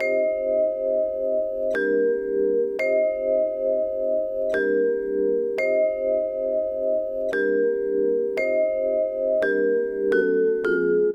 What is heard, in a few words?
Eight Vibes, Hip-Hop